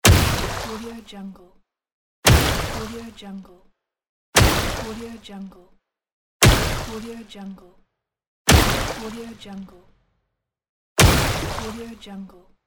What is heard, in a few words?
water punch